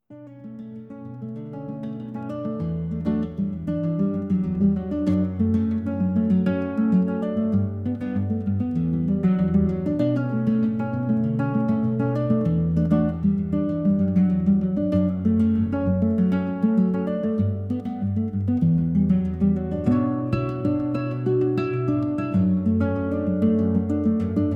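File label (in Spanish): Música Acústica Relajante, Post Punk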